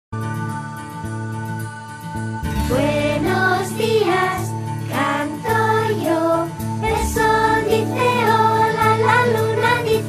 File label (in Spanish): cancion de buenos dias